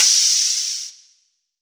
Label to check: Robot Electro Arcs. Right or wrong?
Wrong